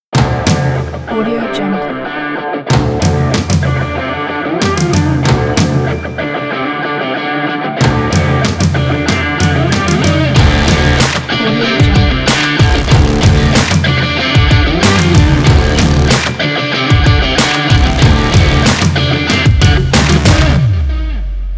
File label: sport breakbeat pack